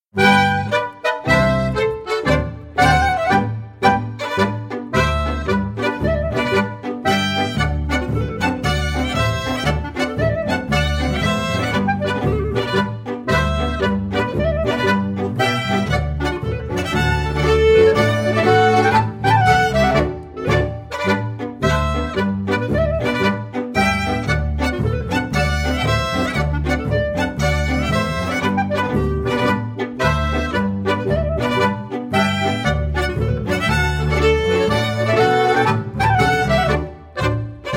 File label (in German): Achtung Marsch